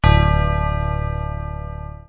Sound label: dramatic bong; Gongs and Super Crashes; single hits